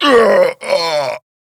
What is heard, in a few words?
Death; New War; Kahl